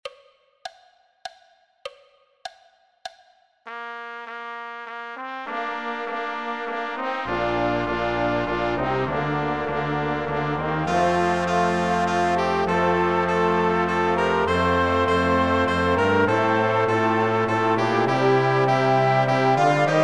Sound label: Intrada Orchester; Pezel Intrada Tempo 100